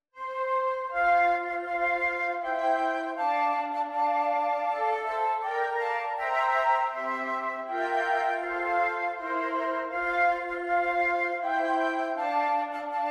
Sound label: trad intro FLUTE, jazz carol for flute quartet; o christmas tree